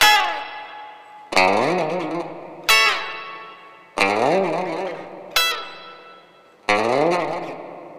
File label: Lounge Gtr-E; Zero-G Kocktail Kollection; Construction Kitz (Lounge); Welk Lounge 090